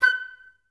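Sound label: flute staccato F#5; Best Service; Peter Siedlaczek's Advanced Orchestra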